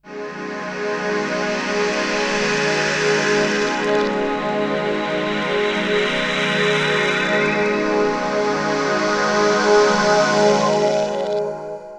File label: Pad, Melodic Synth